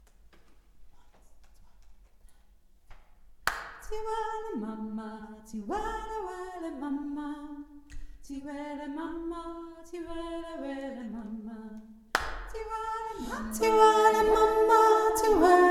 hohe mitttelstimme

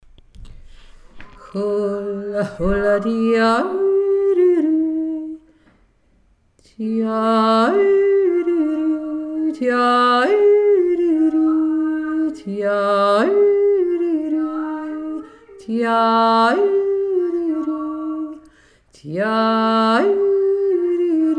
rauschige tiefere stimme

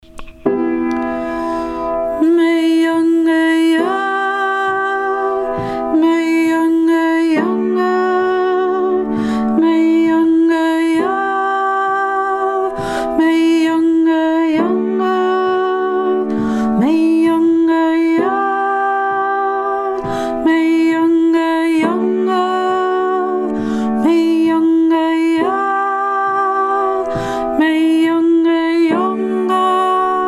mejonghe ye hauptstimme; archaische gesaenge goldegg oktober 2023

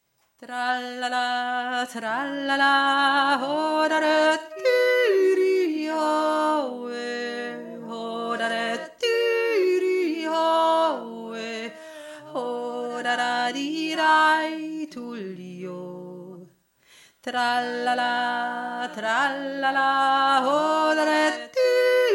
trallala hauptstimme

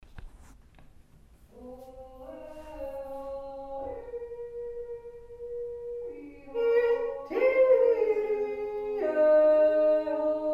stierer dreier alle stimmen

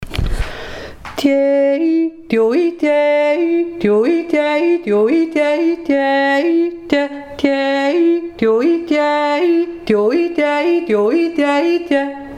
plisse jodler tiefer

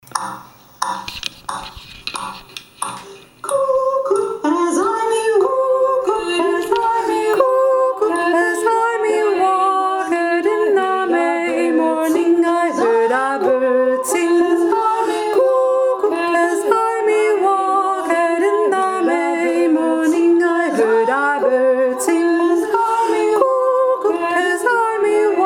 kokoo 3 stimmen

